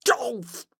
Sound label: histeric short; donnie; Blind Drive